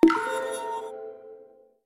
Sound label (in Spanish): sfx estrella; Bunnicula - The Cursed Diamond